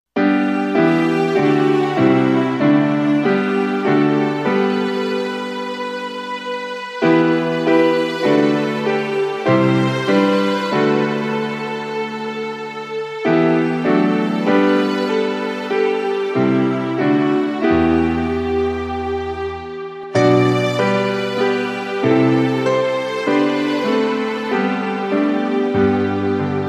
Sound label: Soothing Hymns